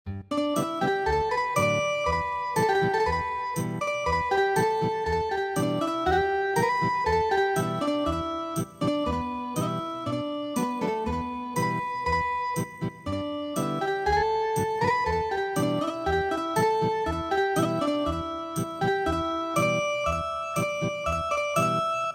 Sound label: Ethnic Guitar; hanagasa ondo